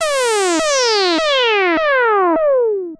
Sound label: Monotron Slow Throbber; transition